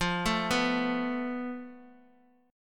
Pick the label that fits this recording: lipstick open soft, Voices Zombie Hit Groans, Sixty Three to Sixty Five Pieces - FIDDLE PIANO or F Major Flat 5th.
F Major Flat 5th